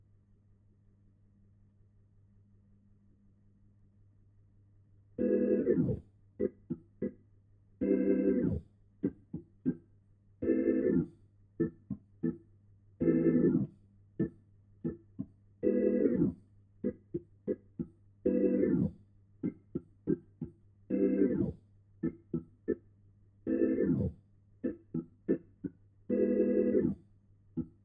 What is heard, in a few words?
Hammond